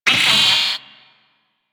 Vocal Pain; Warframe; Amalgam Moa

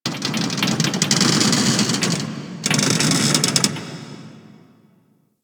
Ocean Close Metal Creaks; Warframe; Grineer Ocean

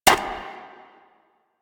Bird Three Single Step; Warframe; Ambience; Entrati

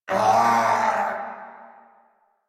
Pain Grineer; Warframe; Grineer Chem Nox

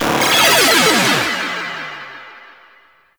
Arcade Fail; Warframe; Nineteeen Ninety Nine; Ninety Nine Snake Game

